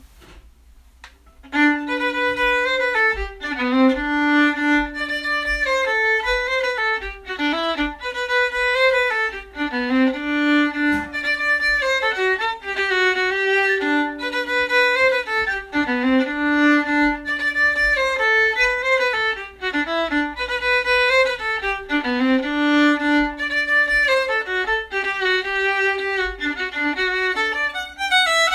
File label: Schottis in G and D